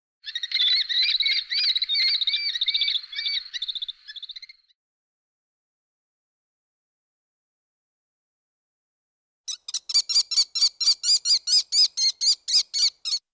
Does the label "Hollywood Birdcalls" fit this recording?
Yes